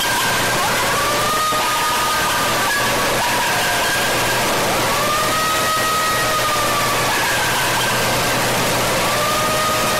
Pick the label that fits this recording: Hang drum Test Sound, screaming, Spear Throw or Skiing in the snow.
screaming